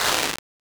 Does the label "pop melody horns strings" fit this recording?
No